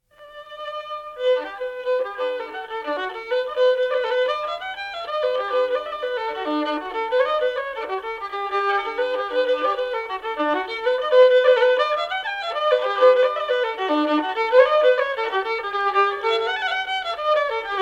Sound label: coppers and brass pitched up, jigs; Top 40 of Sliabh Luachra project